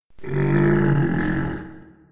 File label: big grunt